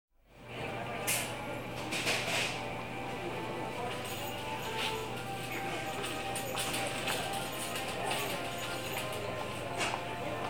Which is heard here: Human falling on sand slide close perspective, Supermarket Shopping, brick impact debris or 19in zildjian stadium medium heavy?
Supermarket Shopping